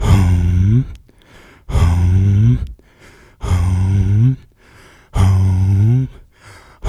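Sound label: 140 bpm, subway tracks; Spectrasonics; Supreme Beats; African Contemporary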